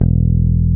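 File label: E1 FINGER BASS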